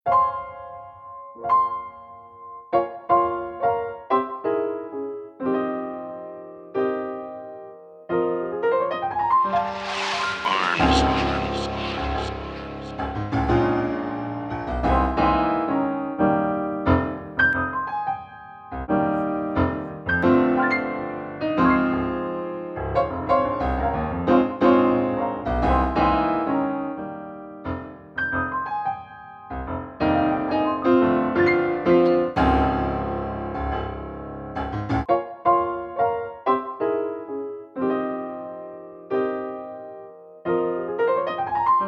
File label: Instrumental